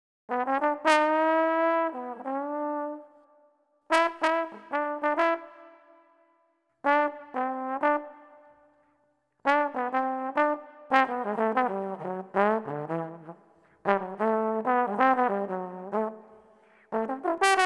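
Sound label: 092 Jazz Trombone E minor; Brass; Pro Tools Essential Loops; Digidesign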